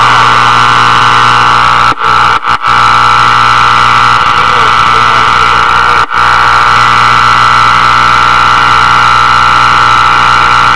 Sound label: Powerline noise CB radio; RFI